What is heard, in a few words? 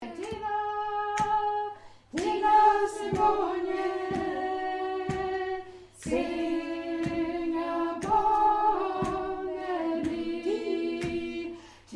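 soprano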